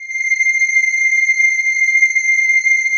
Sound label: PAD, ATMO PADS; Best Service; XX Large